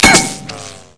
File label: metal, debris